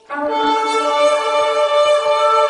Shofar Trumpets

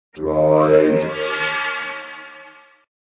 droid sound

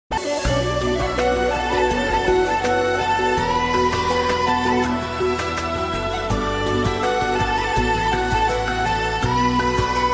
flute special; alternative

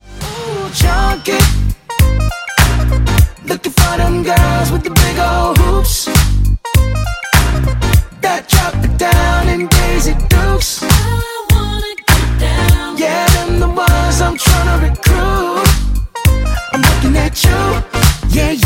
Chunky Chorus

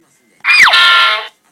cartoon sounds; children

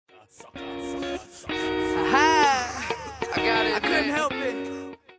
ahaaaa, ringtones

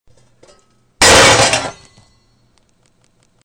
Pots Crash

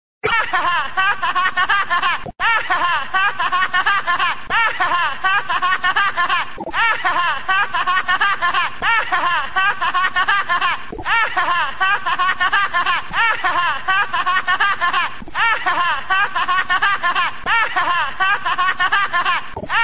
laughs; mandark

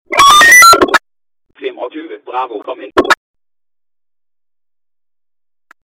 danish police

